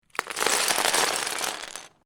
Lego Several Pieces Drop On Floor; Miscellaneous Toy Sound Effects

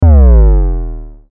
Hit Synth Bass Drop; Blastwave

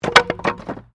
Wood Movement Medium

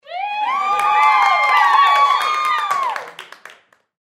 Human Crowd Studio; Crowd Reactions Woo Clap Short; Blastwave

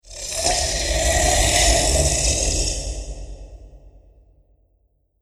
Cave Demon Beast, monster; Monster Sound Effects; Andrew Fleming

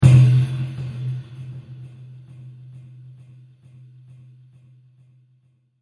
Kettledrum Impact With Metal And Delays; Blasts; Impacts; Crafted Signals

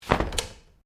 Umbrella Open; Umbrellas; Objects

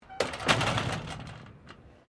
Door Pivot Exterior Aluminium Flyscreen Close; Various doors; Miscellaneous Doors

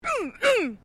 FEMALE GRUNT

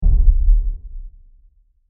Short Diffuse Stab, low impact; Impacts

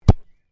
Soccer Ball Bounce On Grass; Football; Sports

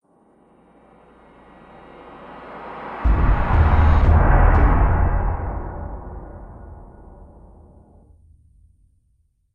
Fantasy Impact Trailer, stinger

